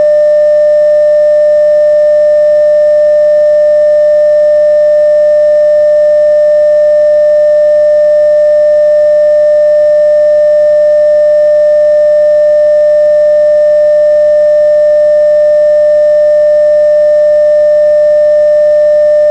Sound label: Main Battery Salvo Alarm